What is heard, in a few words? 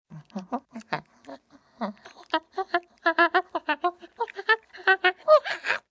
Monkey, Animal sounds, monkey, agitated (human voice); Monkeys; VoiceBox